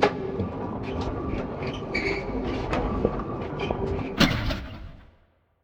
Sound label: silo doors; factorio; Factorio 0.14.21